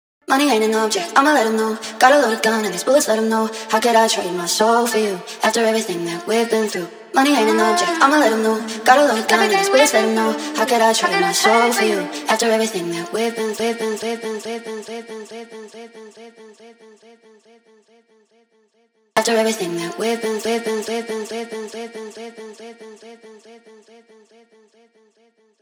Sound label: 140 BPM D Min Vocal, Speed House; Melodies; Loop Stems; Cymatics; GHOST (Beta Pack); Cold Blooded